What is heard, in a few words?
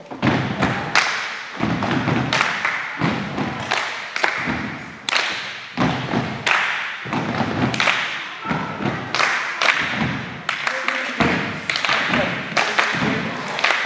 drumming rhythms